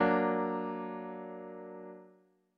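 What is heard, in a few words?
F sharp augmented chord; ackordtabell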